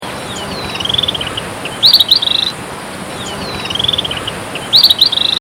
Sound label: tropical kinbird calls